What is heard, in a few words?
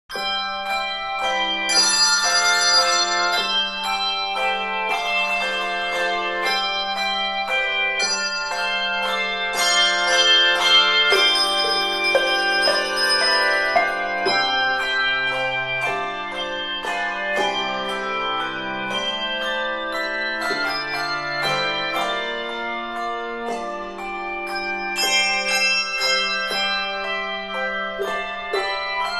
concert bell choir come, thou almighty king; bob jones university